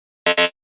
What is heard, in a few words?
Wrong Answer